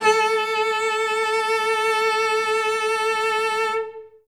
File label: Cello solo A3; Best Service ProSamples vol.51 - Classic Orchestra 2